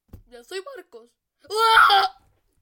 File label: ahhhhh